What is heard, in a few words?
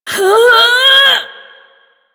Ability Lift Laugh Lich